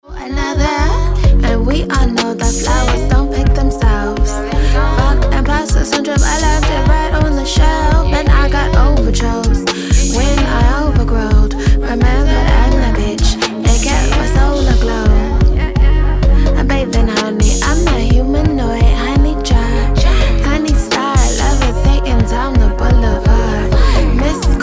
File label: HIP HOP